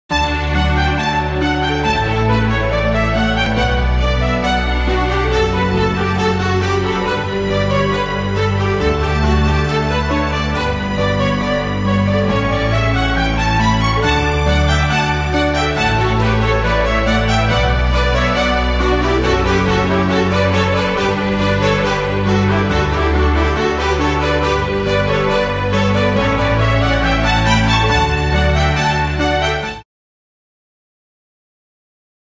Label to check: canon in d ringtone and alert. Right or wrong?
Right